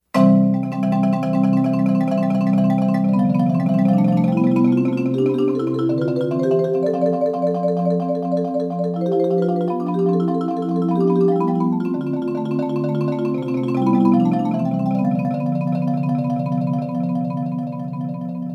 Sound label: solo